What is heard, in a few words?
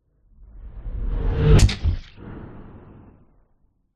sound design whoosh fast dark air whistle hit thud; zapsplat